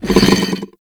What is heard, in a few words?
arm drag; Warframe; Man In The Wall